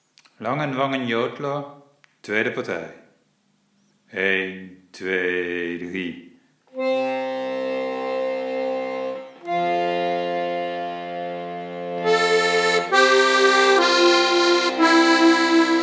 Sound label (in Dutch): langenwangen jodler tweede partij